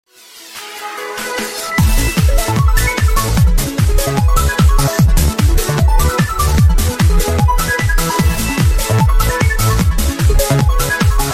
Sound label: Instrumental Ringtone